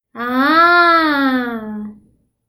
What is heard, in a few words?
A Good Surprise